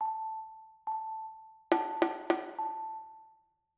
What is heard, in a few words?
rhythm compound 2 beat